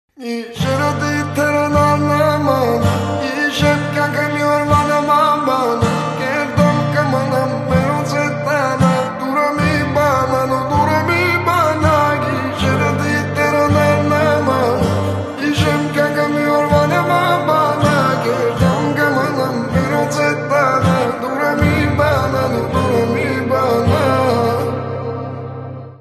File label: acoustic version